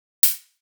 hihat cl; airbrush

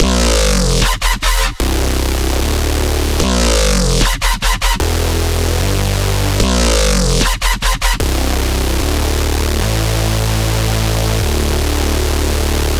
Bass Loop E 150; embers; Brody

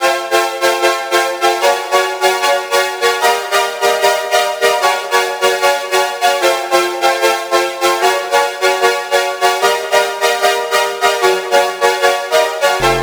Synth Loop 150 F maj; shimmer; Merit

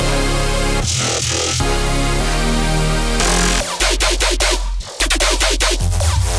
melodic loop F min

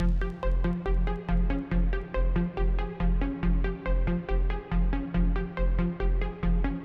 arp

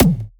Photon Tom; spectrum

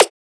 Snare

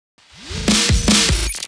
Fill; horizon

